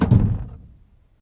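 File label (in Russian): быстрый короткий тихий звук открывающейся двери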